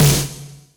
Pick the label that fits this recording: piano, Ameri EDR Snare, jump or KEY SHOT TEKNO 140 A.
Ameri EDR Snare